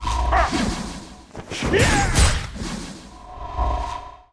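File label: ghost captain attack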